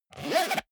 zipper small case close fast

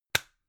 gum plastic box close hard

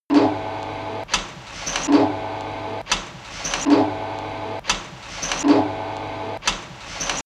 industry machine mechanic electric

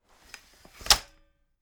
folding chair fold medium

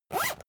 zipper use fast

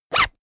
zipper open close

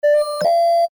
future communicator signal

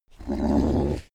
pig grunt excited

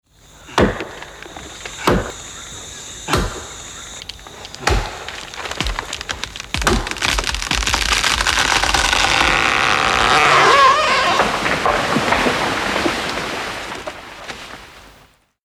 felling a tree

